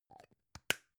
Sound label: gum plastic box close soft